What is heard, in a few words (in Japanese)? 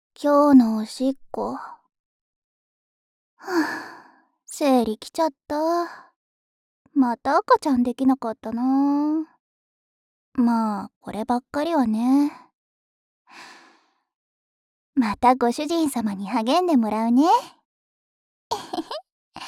今日のおしっこ 普通にトイレで